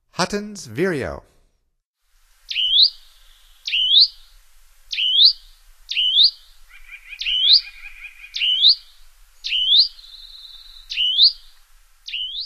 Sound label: Huttons Vireo, Songs of Birds of North America; Stokes